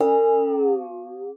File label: WATER, PERC; Xsample VOL-9